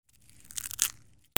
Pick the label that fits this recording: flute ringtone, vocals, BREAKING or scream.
BREAKING